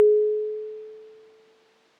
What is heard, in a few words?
Tree Tone Feedback; Ableton; Factory Packs; Inspired by Nature; Dillon Bastan